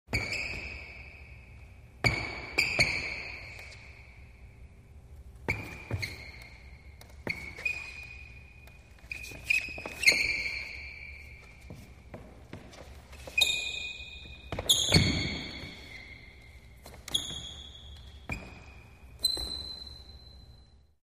Multiple Sneaker Squeaks On Racquetball Court Floor, With Reverberant Ring Out